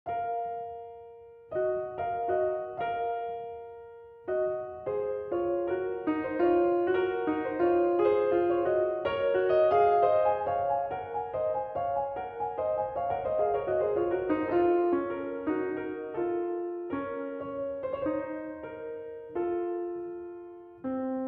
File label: Solo Piano, Berceuse; Jocelyn; Adler